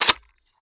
spas reload pump down, weapons, SPAS12

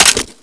drop gun, weapons

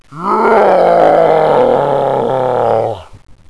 attack, chainsaw guy

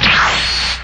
Teleport

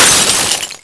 glass

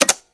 bomb beep on

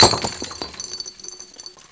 Bottle falling over

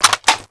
clip insert; scmod

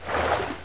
water walk

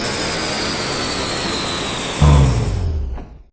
gass out, ambience

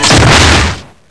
pistol shot

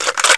clack, weapons

